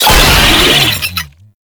Proto Infested Brachiolyst Player Spotted, Vocals, Enemies; Warframe